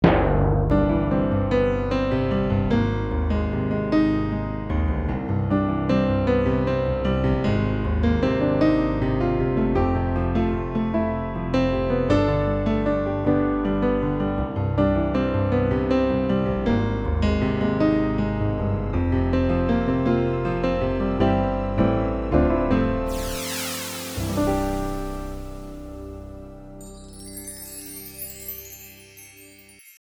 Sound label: Jingle twinkle to end; Rowhouse Cinema